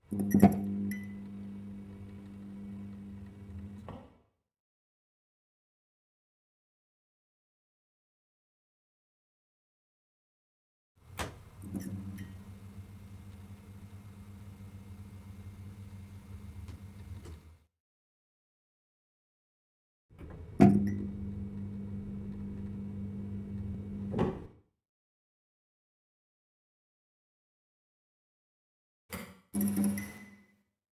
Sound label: Neon